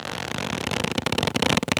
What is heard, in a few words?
Leather